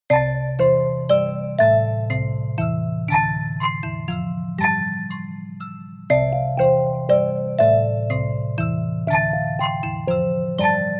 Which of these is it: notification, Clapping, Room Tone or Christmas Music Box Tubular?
Christmas Music Box Tubular